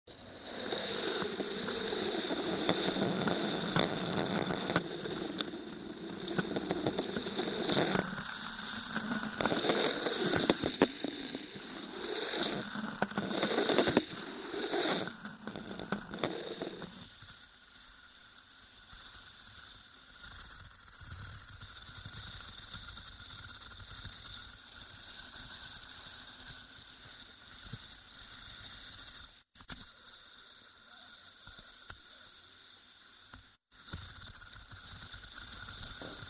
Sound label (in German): klangroller